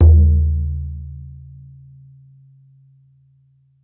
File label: BODHRAN, Celtic Percussion